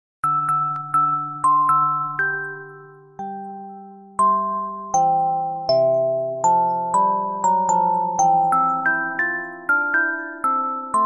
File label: Music Box; Mario Music Box